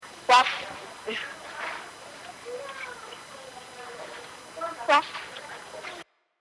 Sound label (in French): Chien